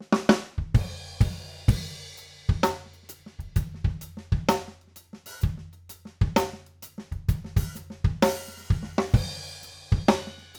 OH Spaced Fig 8, overheads, drum; drum shootout

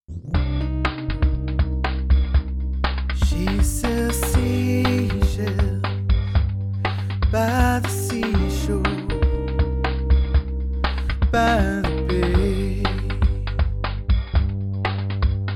FET Song; Mic-Mod Madness

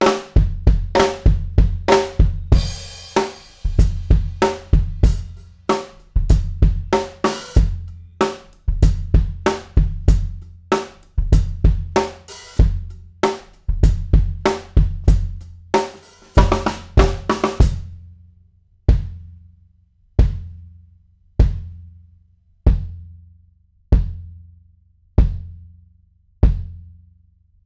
Kick fig 8, drum; drum shootout

